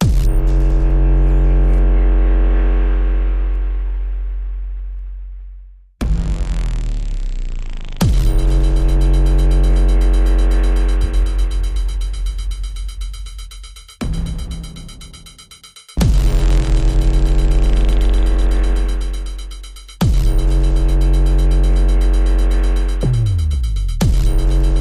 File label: Percussion and FX